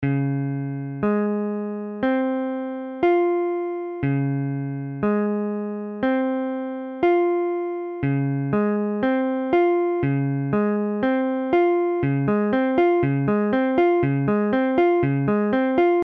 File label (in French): IV C diese 7M, guitare